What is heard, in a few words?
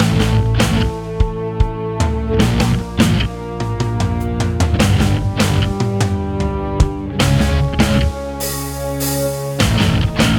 mini combat loop; game ost; revenges waiting; They're Going Down; yannz